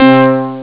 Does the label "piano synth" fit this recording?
Yes